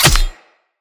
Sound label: Long Sword Impact Flesh